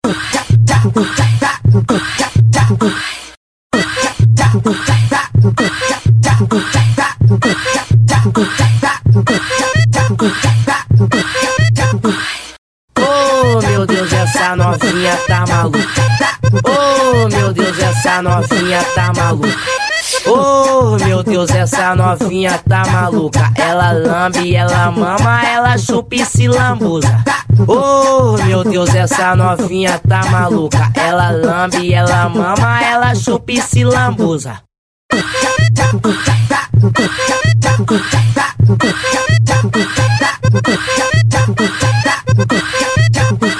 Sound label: funk bass remix